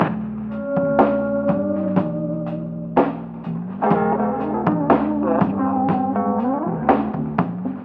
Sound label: darkblue beat; Sonic Foundry (Sony Creative Software); Ugly Remnants VOL-2